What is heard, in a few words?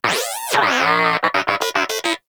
Inquisitive Vocal Enemy Killed; Pets; Warframe; Moa